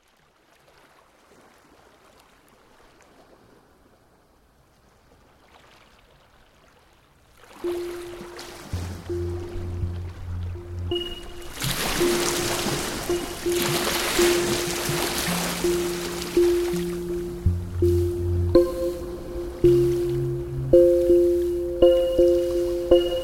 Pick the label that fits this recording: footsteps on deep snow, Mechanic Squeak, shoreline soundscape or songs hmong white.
shoreline soundscape